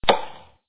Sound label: axe